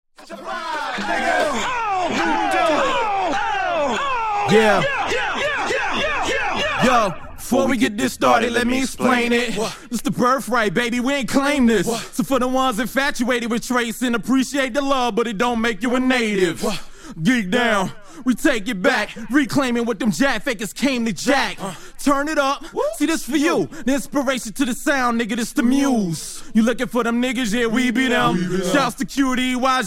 acapella

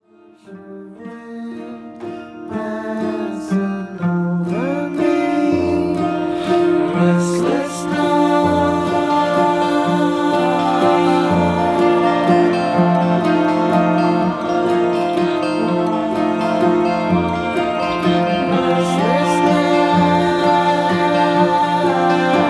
lullabye

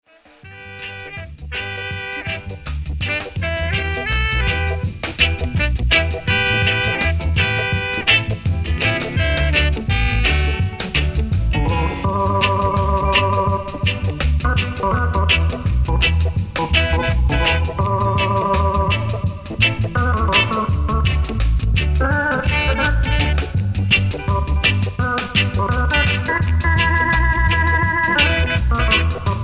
instrumental reggae

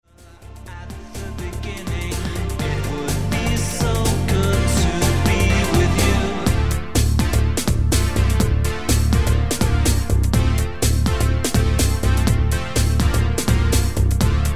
electronic

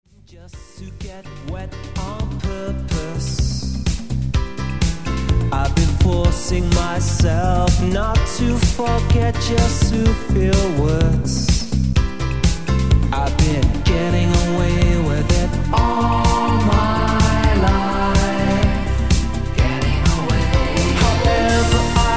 electronic

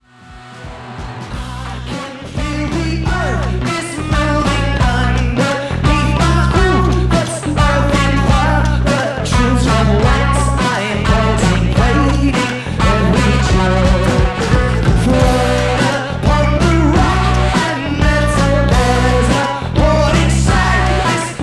wah wah

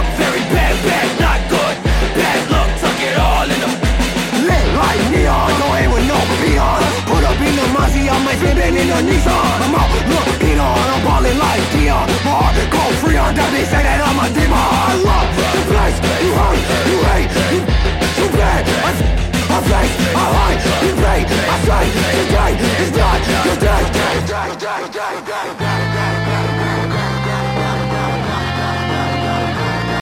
death metal

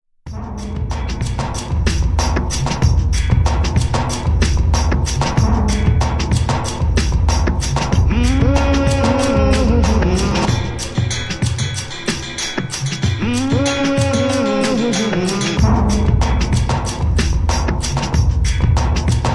afrobeat

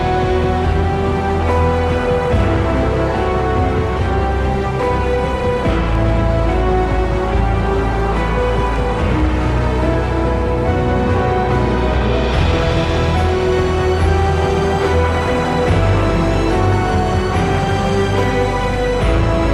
instrumental

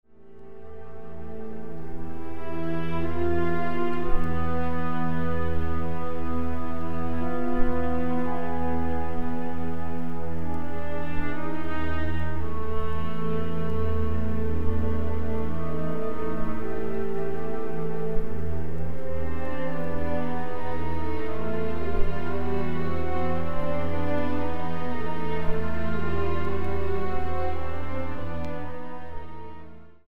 news report

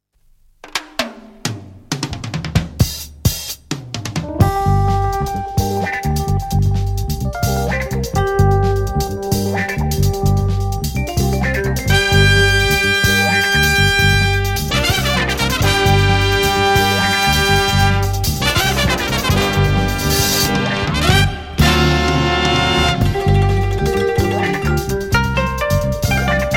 shakuhachi & koto jazz funk

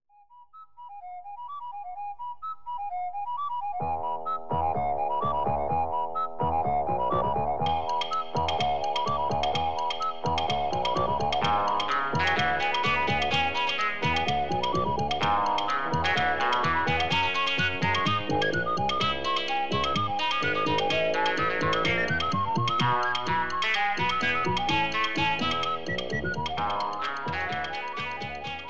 radiophonic music; bbc radiophonic workshop; radio nottingham

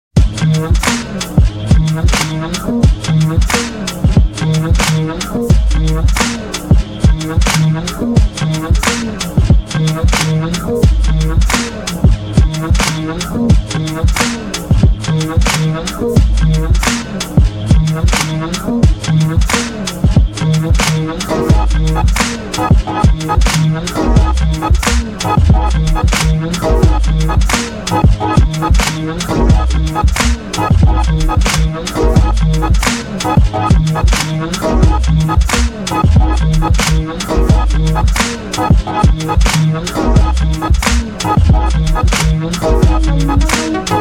piano

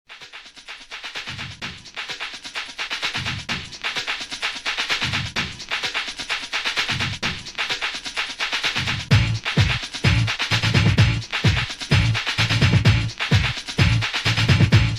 drums

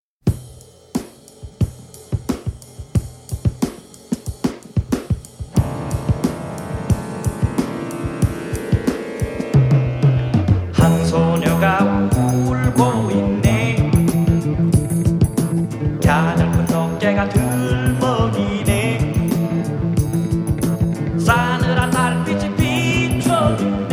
crying girl, korean soul

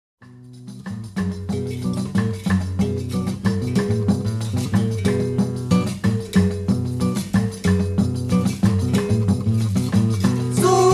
bossa nova berimbau ritmo

